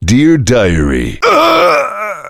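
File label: heavy pain critical death; tf; duke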